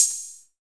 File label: HH, Drum Mashines; EdgeSounds